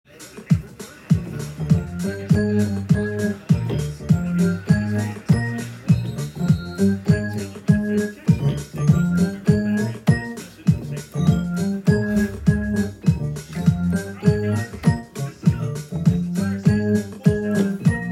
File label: Frog